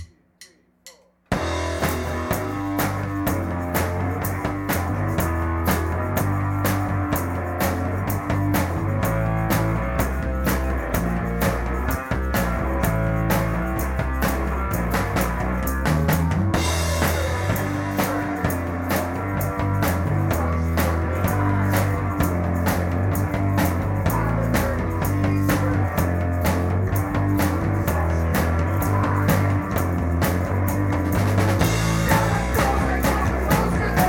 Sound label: g strings